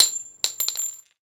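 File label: shell bounce large, weapons, shells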